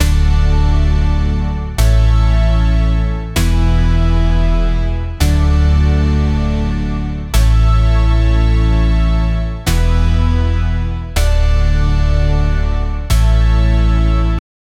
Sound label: synth music of film; production